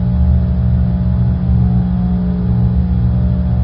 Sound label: indoor; hl2; canal